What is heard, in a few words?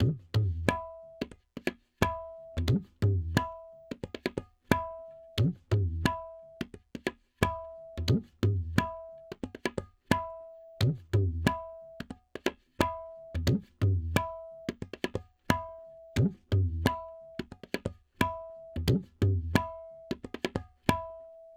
tabla 089, Hip Hop India 089bpm; Hip Hop India Modern Percussion